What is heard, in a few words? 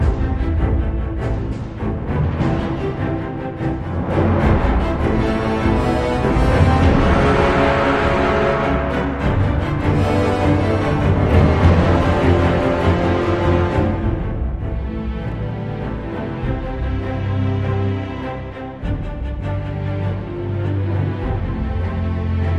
No Choir